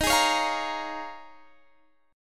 D sharp 7th Suspended 2nd Suspended 4th Guitar Drop D